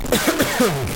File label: Cough